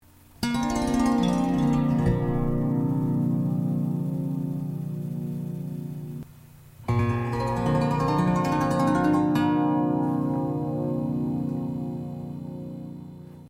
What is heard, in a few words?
Zither